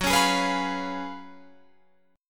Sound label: Gb Major 7th Suspended 2nd Suspended 4th, Guitar Open F